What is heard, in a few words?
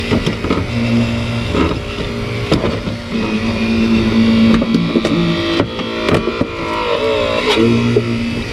spreader; Elite Gaming FiveM; FireTools